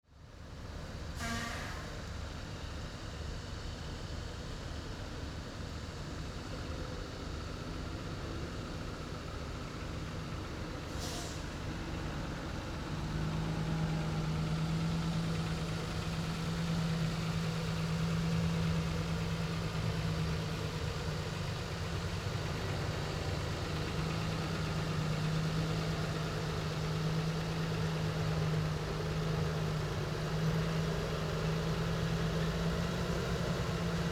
entrada camiones; martin bunge argentina

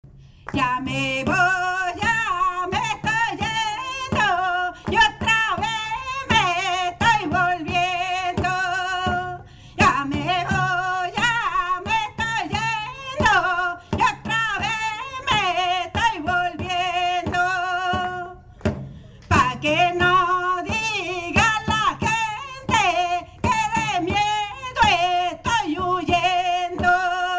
coplas; sierras

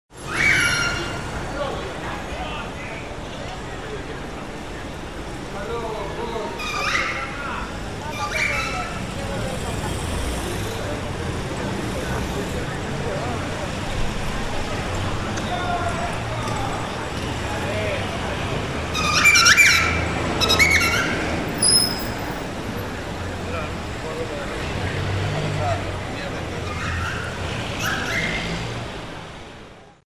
calles inspector de transito; san luis y mitre

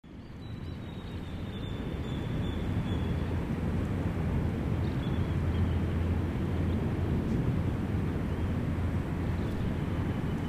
calles; pje edgardo shork

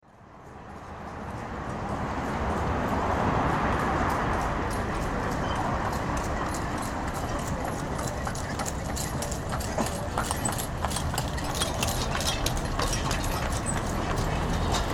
calles; junin e iguazu

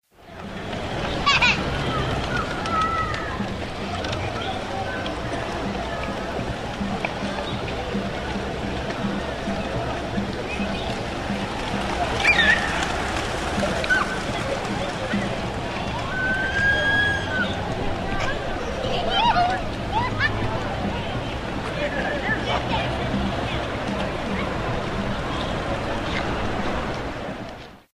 plazas nino jugando con palomas; montenegro

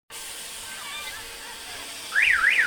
avioncito, san bernardo; bs as

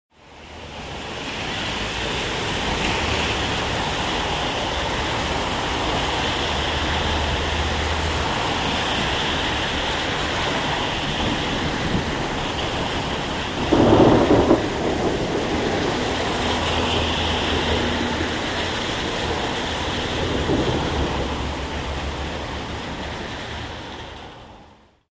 calles av eva peron y magallanes lluvia; Rosario

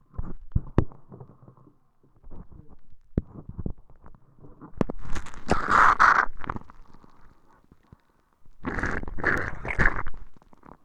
toma mate; Oído Absoluto

